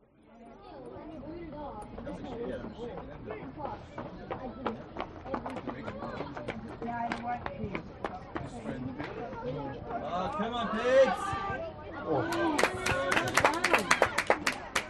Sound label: sport soccer game school competition stand small amb parents children cheer clap; chatter